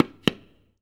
TABLA TITE; AKAI S-Series CD-ROM Sound Library VOL-5

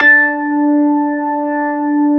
B3 HAM D4, organ; AKAI S-Series CD-ROM Sound Library VOL-2

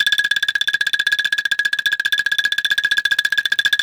ANGKLUNG G4; Spectrasonics - Heart of Asia CD2

